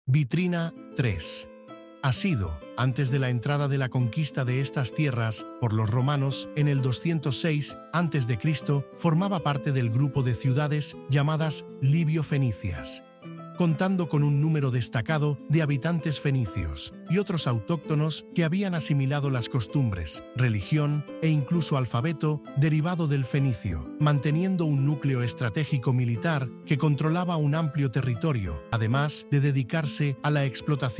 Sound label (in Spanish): audioguia música